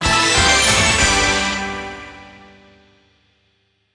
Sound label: Gacha Jingle